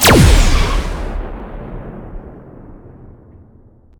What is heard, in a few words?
pulse cannon, ships combat